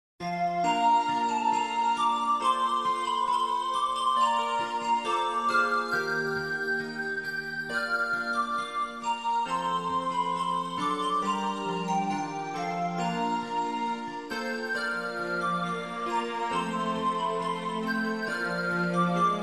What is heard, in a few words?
Auld Lang Syne, Soft